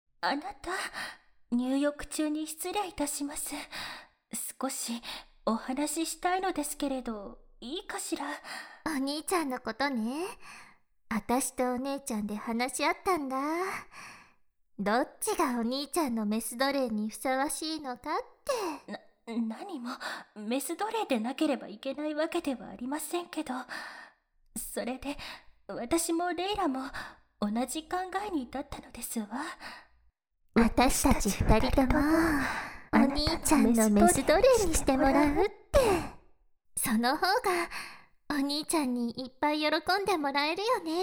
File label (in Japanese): 耳舐め濃厚ご奉仕; 効果音なし; 御園姉妹による仲直りＷ耳舐め濃厚ご奉仕！